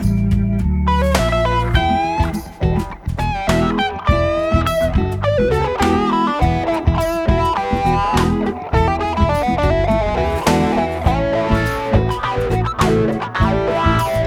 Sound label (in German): gitarre comping re-amping comping